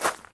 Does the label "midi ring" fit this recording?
No